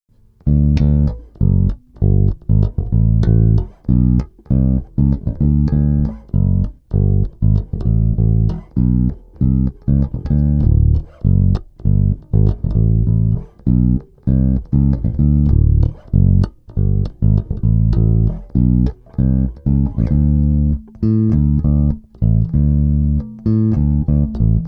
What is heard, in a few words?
Soul Mix, basses